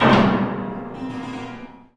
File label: chord rop D; COMPLEXHITS